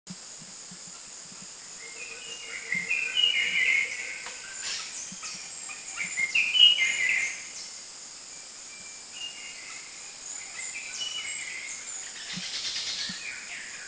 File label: Philippine Bulbul; Philippine Bulbul Ixos philippinus Country endemic; Tabunan; Seen on every single day of the tour.